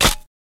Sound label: Camera Click No Moto, shutters; shutters & movement